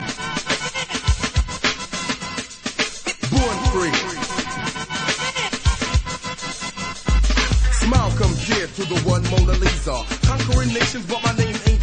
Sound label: beautiful english song tone ringtone; iphone ringtones